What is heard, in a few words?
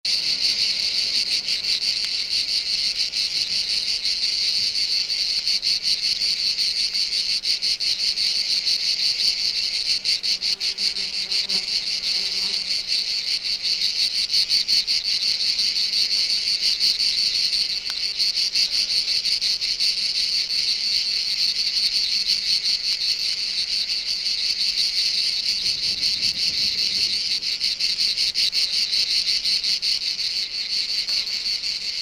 Crickets